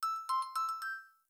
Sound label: nokia 2600 tang ringtone